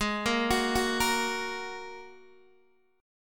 G sharp 7th Suspended 2nd G sharp Guitar DADGAD

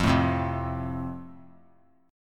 F Major, F Chord; strummed

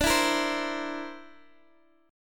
D 7th Suspended 2nd Sharp 5th, Guitar, Open G

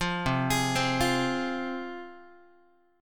C Suspended 4th Sharp 5th C Guitar; arpeggiated; 8,8,11,10,9,x; JGuitar

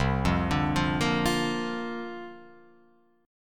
C 9th Suspended 4th, Guitar Open F, chord; C9sus4 arpeggiated; JGuitar

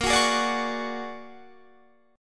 Bb 7th Sharp 5th Sharp 9th Bb; Bb7#5 #9

